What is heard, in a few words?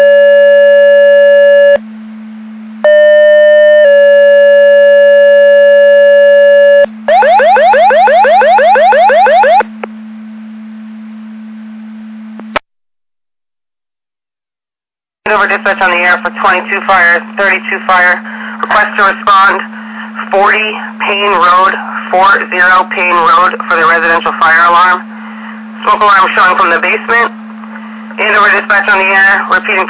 Siren; Andover Twp Boro